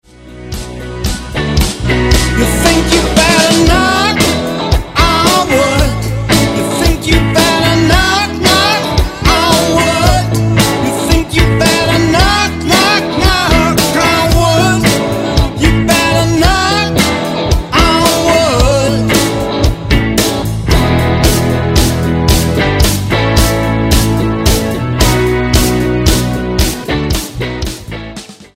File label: kurz